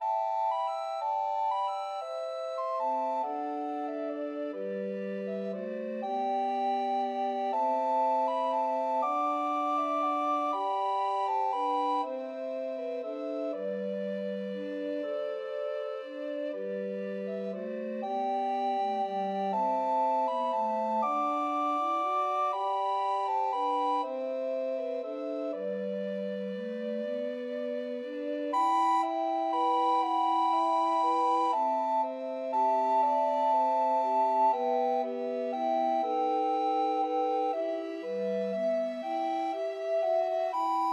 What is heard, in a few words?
Scottish Island Tunes